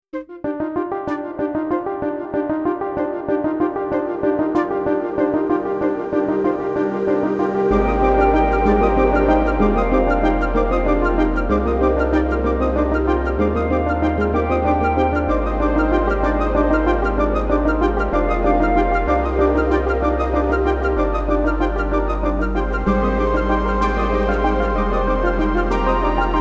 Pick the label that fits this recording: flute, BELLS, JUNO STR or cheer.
flute